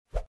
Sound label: whoosh; pet